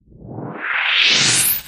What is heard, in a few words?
Short Noise Transition